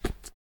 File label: U I Passport